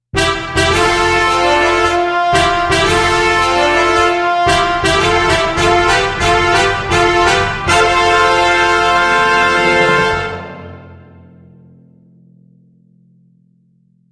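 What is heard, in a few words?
fanfare and solemn award ceremony sounds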